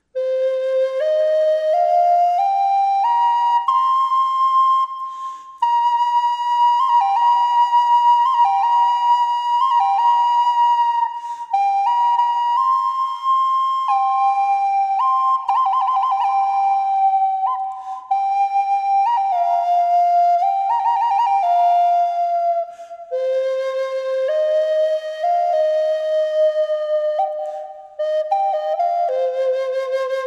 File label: Maple Burl